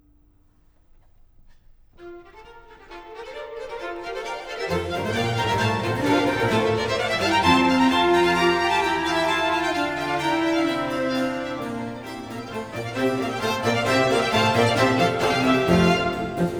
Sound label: Concerto F-dur, Allegro Molto, violoncello; RV 411; Antonio Vivaldi (1678 - 1741)